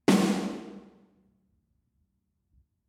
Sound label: Room